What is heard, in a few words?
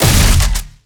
Shotgun Fire; Guntech Tormentor